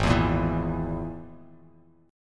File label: G sharp Suspended 2nd Sharp 5th G sharp down Bass Standard; G#sus2#5; strummed; JGuitar